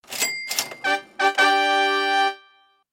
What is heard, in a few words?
Lottery horn